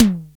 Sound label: DRM SIMM, Analog Drums; Roland L-CD701